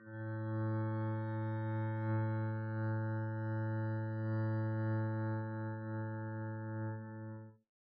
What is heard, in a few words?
arco a2 pp down, double bass; dsmolken